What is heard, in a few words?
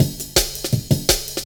cut, drum loops, 165.5; Jungle; Zero-G Jungle Warfare 1, 2 & 3; Scotti; 90 s Sample CDs